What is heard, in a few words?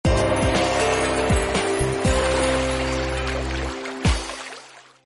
win